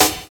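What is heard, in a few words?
hyper snare; Drums In Your FACE!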